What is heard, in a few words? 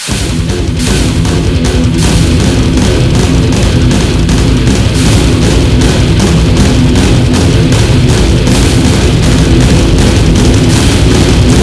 annoying tune